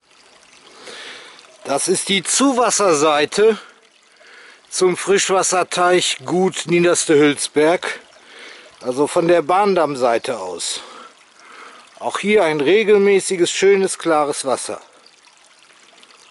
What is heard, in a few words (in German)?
Zufluss Wasser